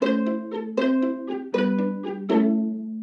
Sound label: Jingle Save